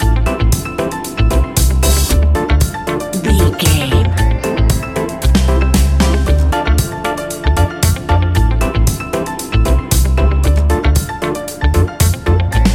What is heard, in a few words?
cuban reggae music, A cool fusion and blend of traditional island reggae music with cuban latin sounds